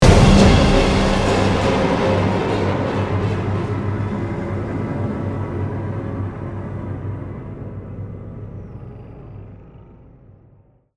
Mx Hivemind Combat Outro

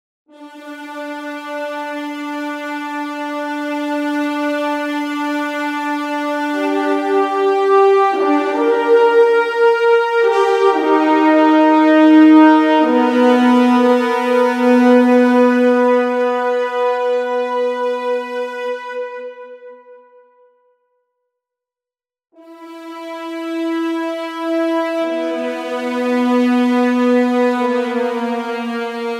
Cave Mid Hi Cor

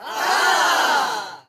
reaction

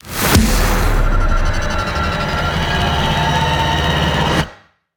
combat 176bpm; Doom; GLORY

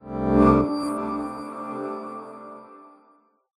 GUI window Open

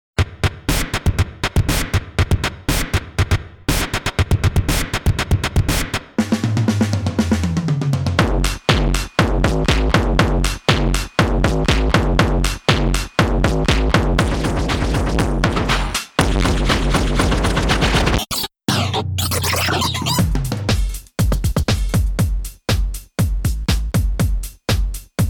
Undead Drums; everhood original soundtrack 2022

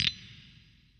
Falling

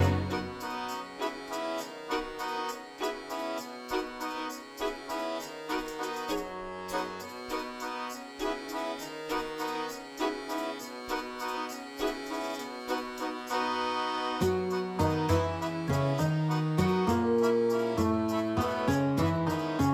Music Ingame Town Loop; Brigade; Battle Chef Brigade

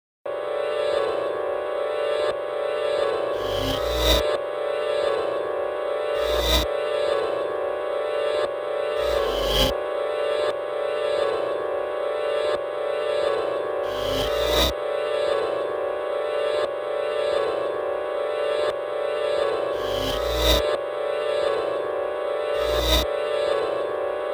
Meditation

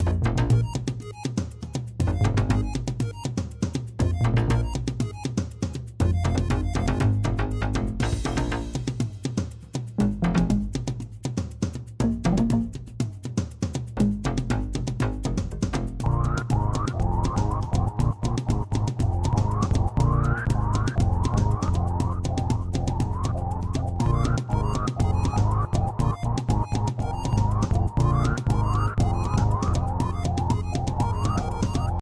Cinematic; Hunter's Legacy Purrfect Edition

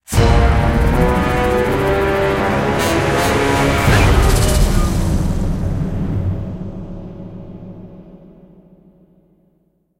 Terror Sting; jurassic park the game android ios ps3 windows xbox 360 gamerip 2011

